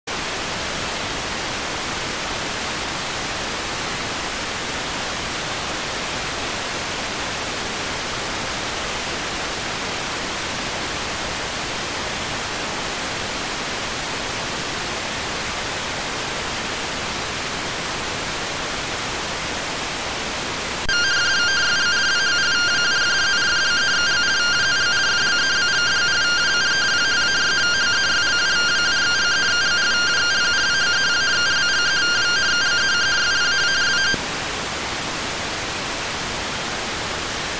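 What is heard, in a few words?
radioteletype receive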